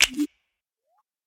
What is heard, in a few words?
wipe